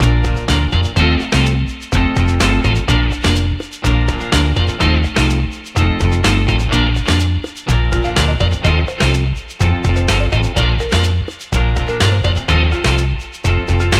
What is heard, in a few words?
Timer Music; Fakin' It; Jackbox Games; The Jackbox Party Pack 3